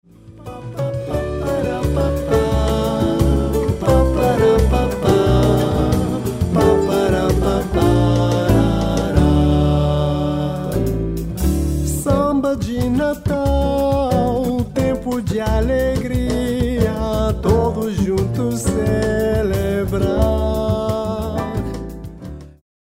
Samba PORTUGUESE

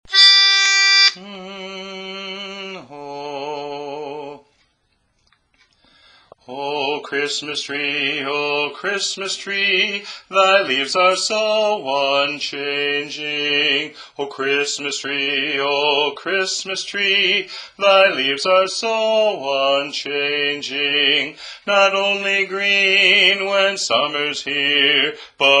Bass; O Tannenbaum

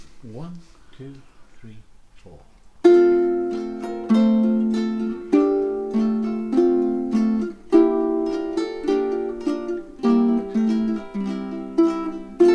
Harmony Practice; In The Bleak Midwinter

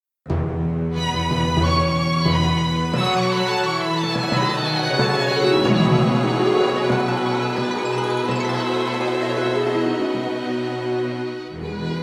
Custom Backing Track

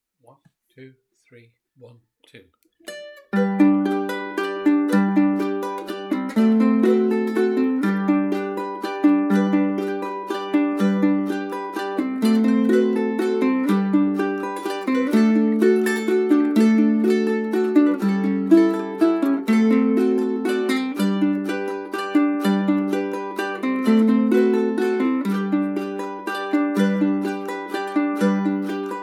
Rolling Pick Practi; Come Hasten Ye Shepherds

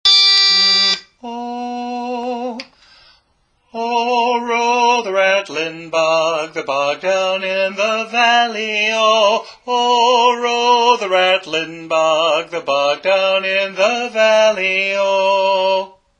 Rattlin Bog (Lead)

